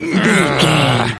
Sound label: voice male growl